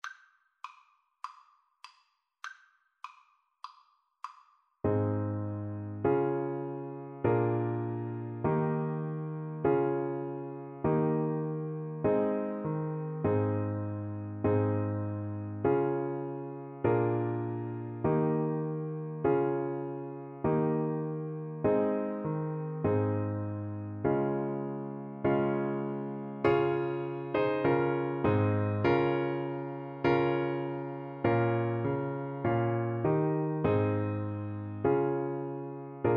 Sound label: tenor horn